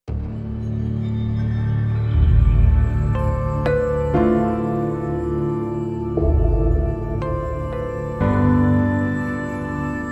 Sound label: music